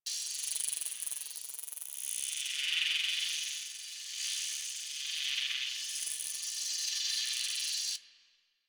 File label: Energy Thread Loop; Warframe; Apostasy